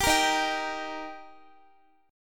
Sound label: D sharp Major, Guitar; strummed; x,x,17,15,15,14; 4E,4A,5D,5G,5C,6F; chord shape